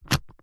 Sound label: luggage briefcase combination lock dial single turn; foley; zapsplat